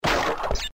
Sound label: crash